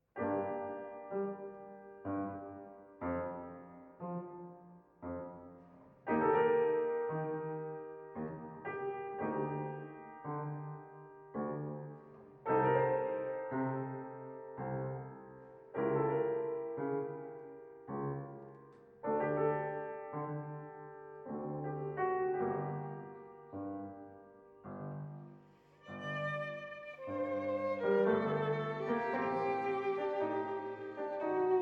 Adagio in G Minor for Strings and Organ; Albinoni's Adagio

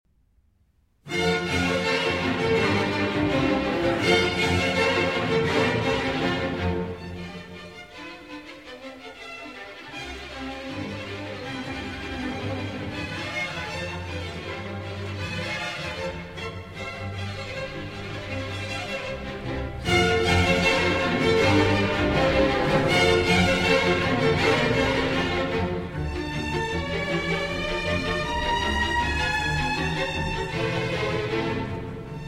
Divertimento in F Major, Presto; Mozart Divertimento in F Major, K. 138 - III. Presto

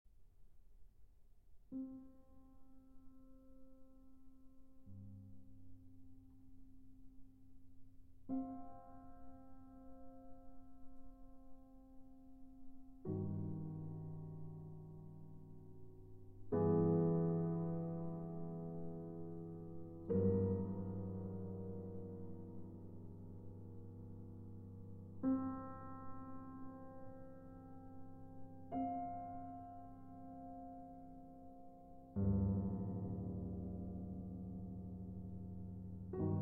For Piano, Upright Piano; Játékok Spiele Games, Band VIII (2010)

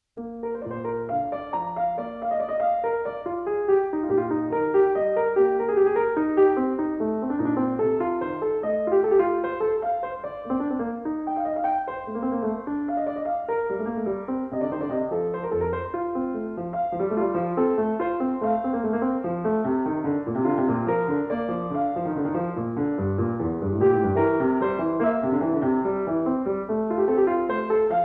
Invention In B

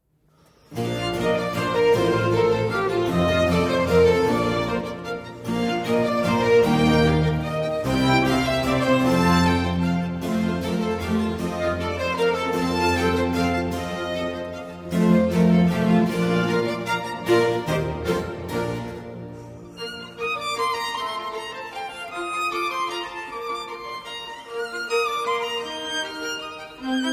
Violin Concerto in B-Flat Major Allegro; Violin Concerto in B-Flat Major, RV 369; III. Allegro

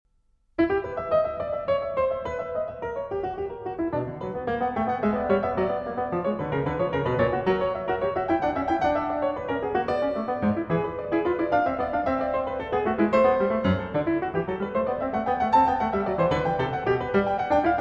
Prelude & Fugue in E Minor

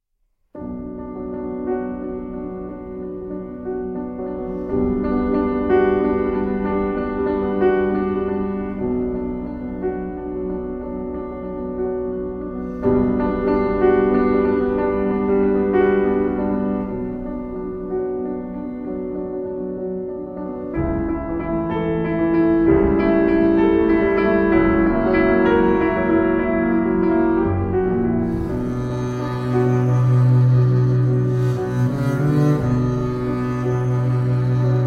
Double Bass & Piano; From the Motion Picture Soundtrack 'The Hours'

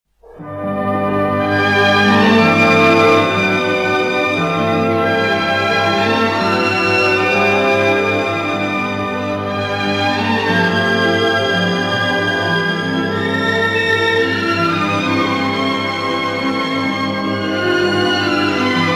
Orchestra

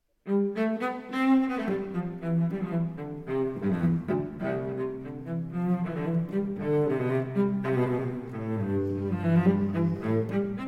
Cello Suite in C Major, Allemande; Cello Suite No. 3; BWV 1009 II.

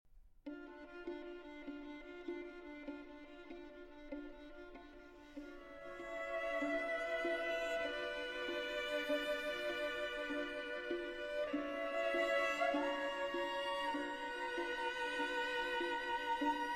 String Quartet Andante Molto Sostenuto; Schulhoff String Quartet No. 1 - IV. Andante Molto Sostenuto

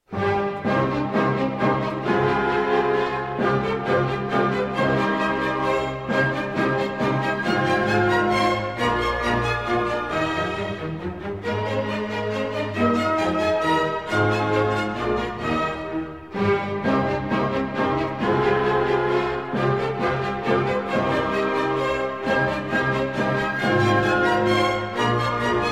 Symphony No. 2 in B Flat Major; Schubert Symphony No. 2 in B Flat Major, D. 125 - III. M

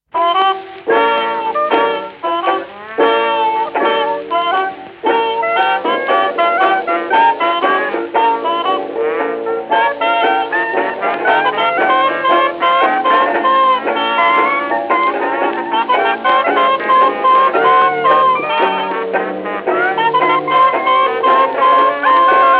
Crawdad Blues

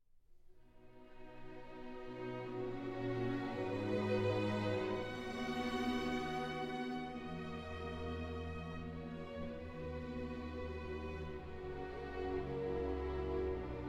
Symphony in E-Flat Major Adagio

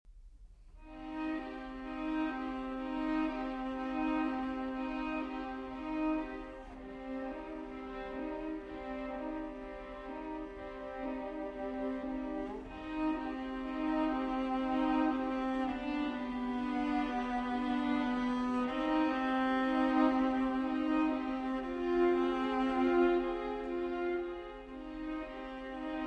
String Quartet, Arr. For Ensemble

